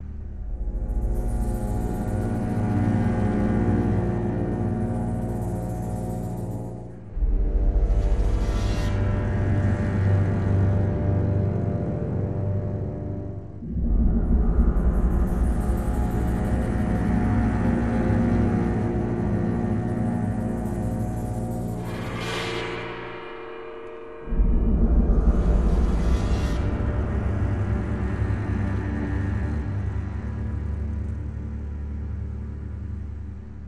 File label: STRING CYMB LP; transformers war for cybertron gamerip